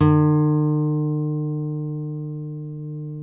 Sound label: NYL NV E, nylon no vib guitars; Spectrasonics; Hans Zimmer Guitars VOL-1